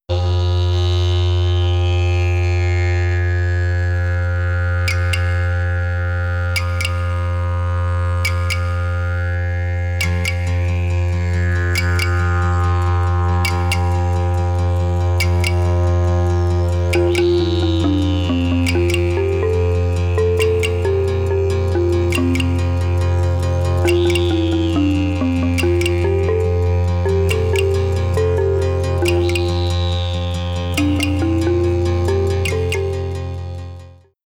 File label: backing